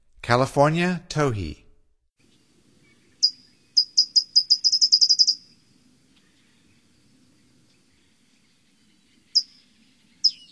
California Towhee, Songs of Birds of North America; Stokes